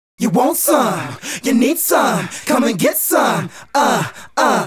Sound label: Male Group, Vocal; Sonic Foundry (Sony Creative Software); Vocal Toolbox